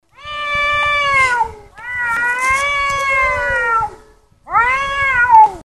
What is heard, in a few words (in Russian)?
zvuk orushhego kota